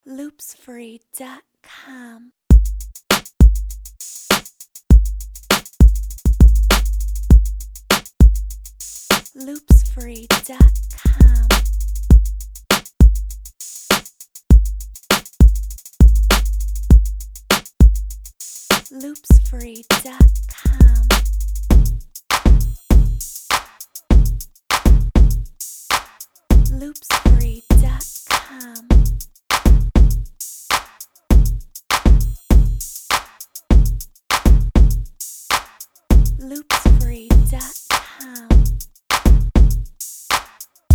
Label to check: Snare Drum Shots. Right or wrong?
Right